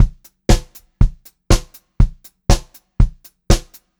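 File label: 120 pop variation, Pop-Rock Drumloops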